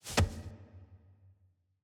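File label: ambience room polygon punchbag training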